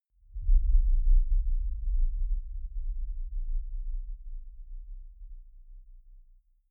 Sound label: deep sub hit rumble, Hits/Impacts